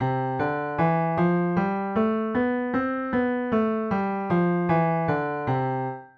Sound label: c major scale